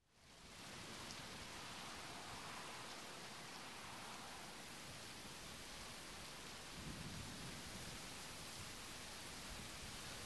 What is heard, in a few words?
Rain, Heavy Under Bridge, Thunder; Sound Ideas 2000 Series